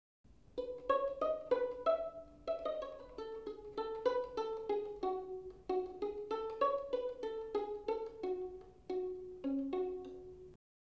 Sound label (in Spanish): Sonido de violin en pizzicato; Instrumentos de cuerda; Cuerda frotada; Sonoridades especiales